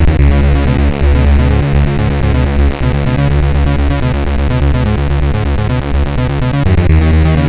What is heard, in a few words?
intro, sid MOS6581R2; Hysteria III